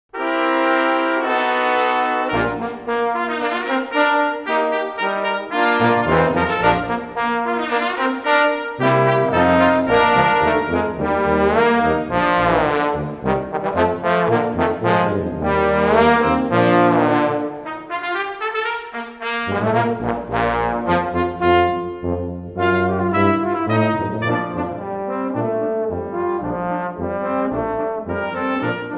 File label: brass ensemble